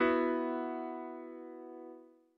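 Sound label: B sus chord; ackordtabell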